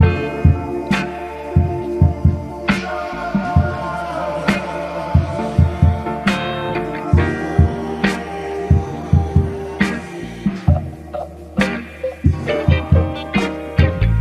sample 135 BPM

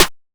Snare; The Lunch77 Sound Design Kit (Vol. 2)

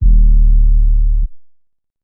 808's

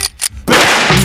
Click, Percs

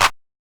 Clap; The Lunch77 Sound Design Kit (Vol. 2)

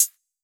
Closed Hat

